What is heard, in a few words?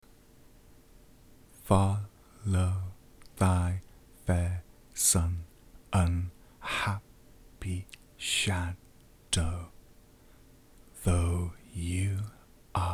Em 140 renaissance guitar impro